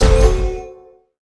FAIL

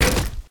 Drop

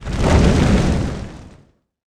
Action Large

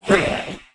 Hit

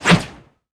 Basic Swish M

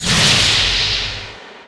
storms

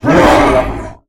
Death

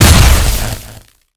Long-distance Totem Blow; Summoner

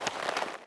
Idle leather mid

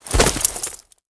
DROP

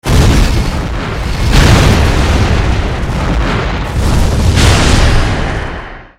armor brk Cast

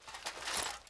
metal mid

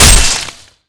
DMG HIT

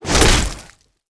Weapons Swing

